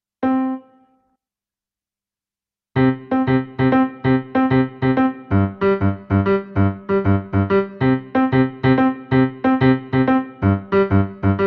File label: Piano